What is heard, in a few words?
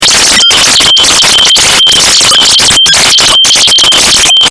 fm synths